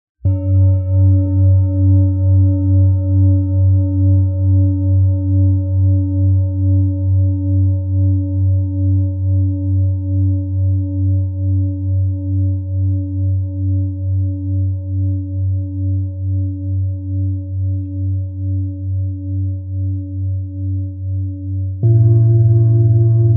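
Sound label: Contemporary G Major Quarter Tone Set Strike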